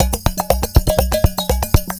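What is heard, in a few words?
120 COMBO, drum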